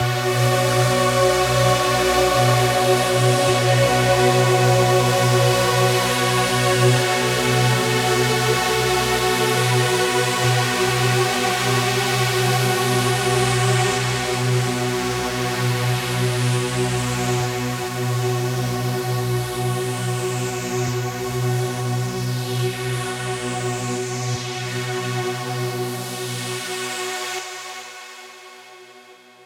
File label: Huge Pad Break Outro 138 BPM, Bminor; Unlooped